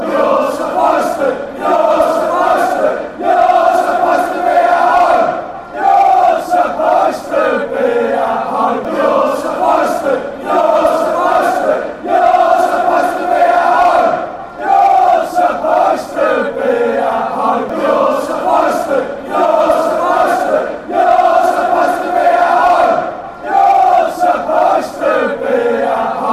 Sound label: youre supposed be home fanchants; brighton